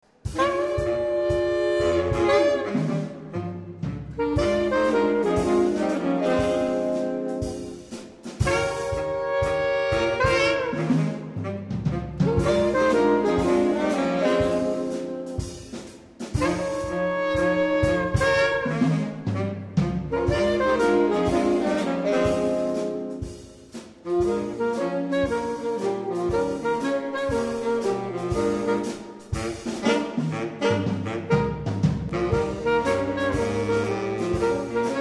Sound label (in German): Saxquartett und drum; Saxquartett und Schlagzeug des MV Spöck